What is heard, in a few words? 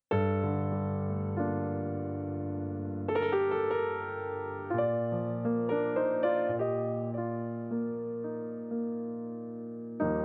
Solo Piano ToolBox Wide